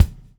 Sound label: BUBBLE; Spectrasonics - Backbeat